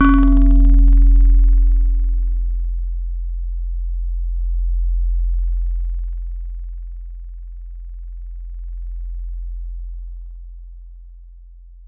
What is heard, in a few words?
Glass Marimb; Synthetic's Serum Collection; Echo Sound Works Sphere